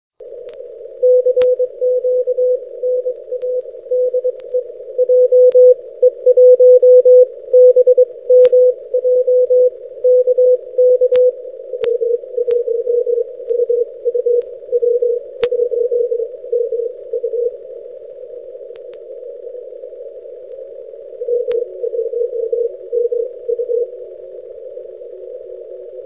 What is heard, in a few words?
6m; W1MU